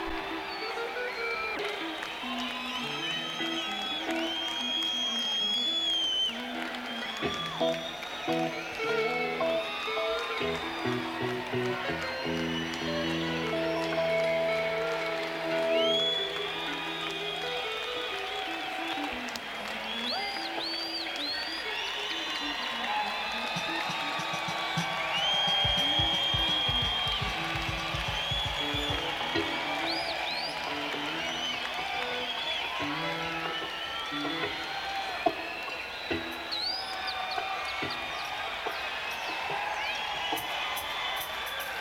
Crowd Tuning

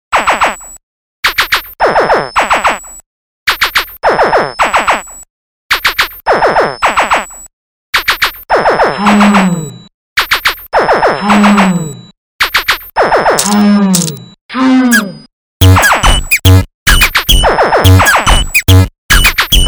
Acoustic Shitter

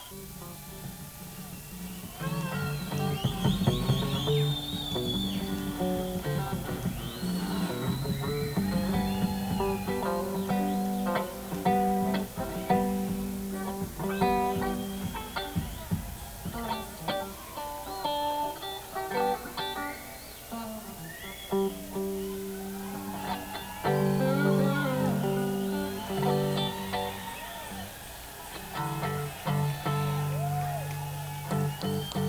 Crowd Tuning

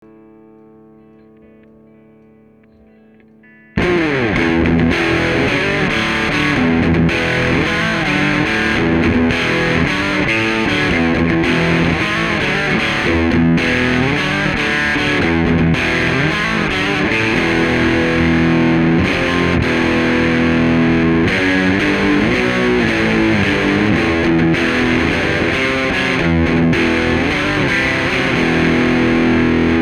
guitar; converter

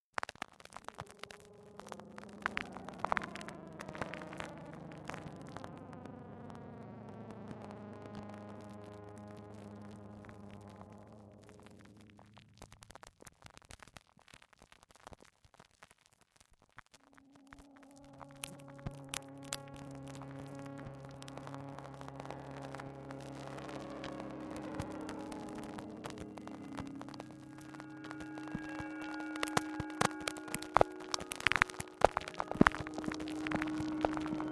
statique and glockenspiels; darcin

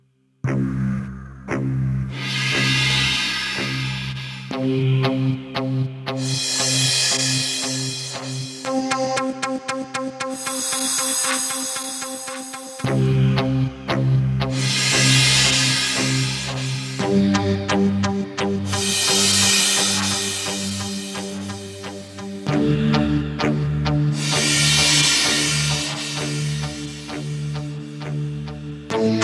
Arab Sabre, Kawai K1 preset

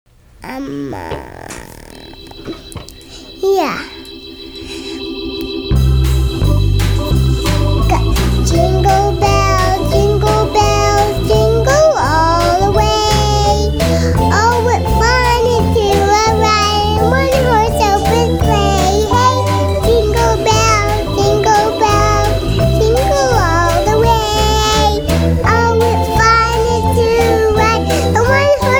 jingle bells

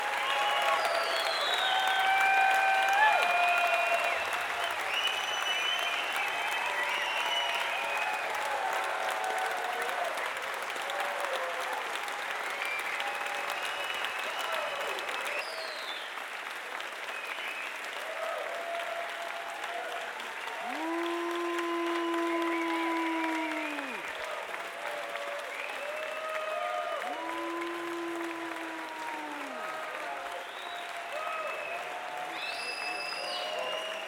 crowd

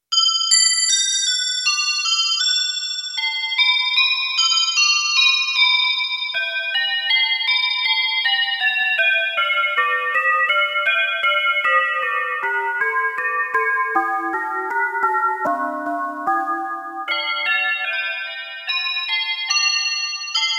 Stereo bell; Kawai K1

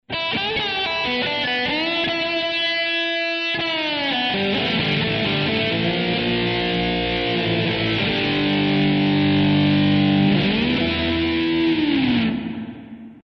Bottleslide guitar

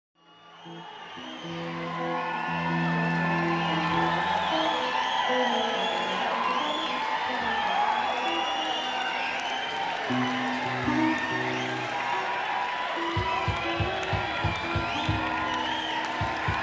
Crowd; beyer m160

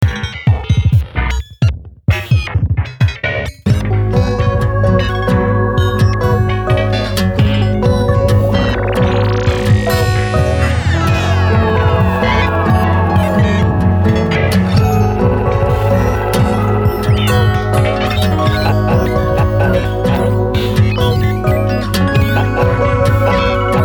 modulmusik analog

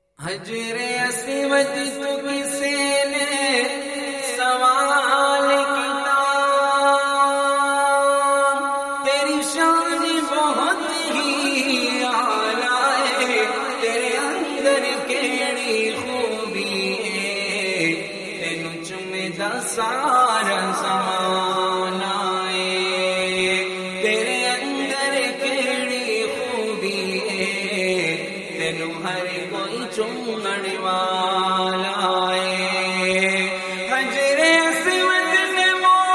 Punjabi Naat

